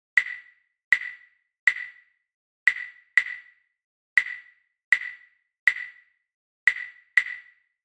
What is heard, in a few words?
clave